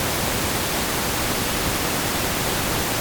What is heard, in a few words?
Sine And Noise Noise